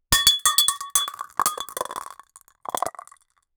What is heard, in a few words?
a large metal cube breaks